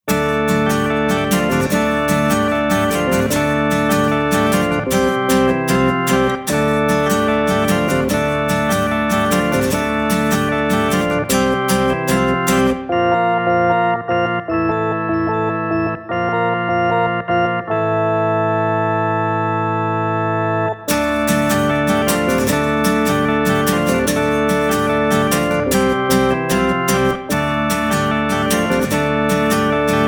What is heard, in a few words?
Organ Love